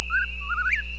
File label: default beep